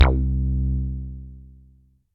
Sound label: DM BAS, Dance Mega Synth; Best Service